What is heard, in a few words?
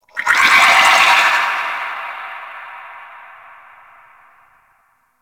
Misc One Off, Ambience; Warframe; Tenno